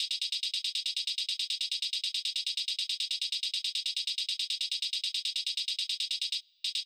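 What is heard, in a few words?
drum Loop 140BPM closed hi-hat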